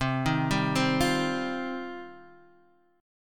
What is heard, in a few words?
D sharp 6th Add 9th C